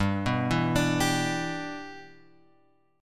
G 6th, strummed; G6 Chord {3 2 2 X 3 3} arpeggiated

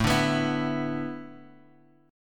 A Suspended 2nd Suspended 4th, Bass Standard

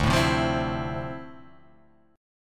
D Minor 9th, down

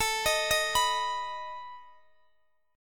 A Suspended 2nd Flat 5th A down Guitar DADGAD

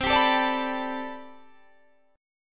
A Minor 11th C, Chord

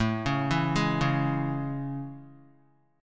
A Major 7th, Guitar; arpeggiated

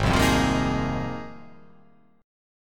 Ab 6th Add 9th, chord; strummed; JGuitar

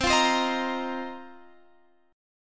Bb Suspended 2nd Suspended 4th C

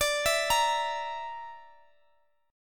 D Suspended 2nd Sharp 5th D, Guitar, Open G

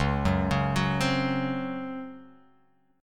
C sharp Major 7th Suspended 4th C sharp

